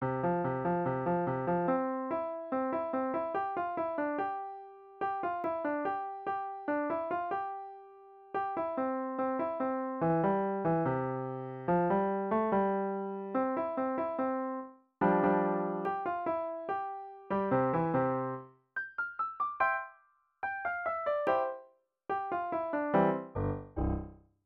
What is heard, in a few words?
Easy Piano; songs for kids